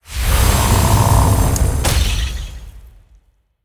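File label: Wind Guardian spawn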